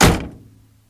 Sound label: truck close; gmod; tdmcars